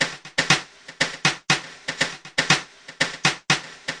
just some crunchy snares, 120 bpm

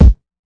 lofi kick; Kicks; single hits

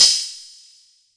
606 cymbal, drum machine; 606 Basic

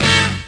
BRASS HIT; Punches Hits Discoblasts; single hits

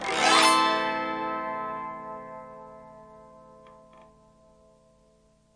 autoharp A min, Multisampled Autoharp Chords

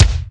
Kick, Roland R-8

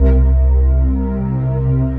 Synthesizer Middle C Notes, Lead; multisampled; synth keys

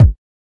kik

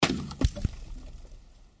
snowball miss, Throwing Snowballs; Throwing Snowballs Kit

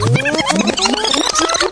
rewind vinyl; FILLS and ROLLS

